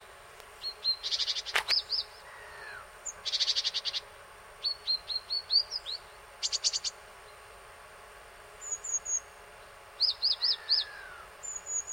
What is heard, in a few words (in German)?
Invasions-Kohlmeise Weibchen; Lindau BY